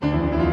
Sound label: re mi re mi fa; BRAHMS2 II; Les Concertos du Concours : le 2e Brahms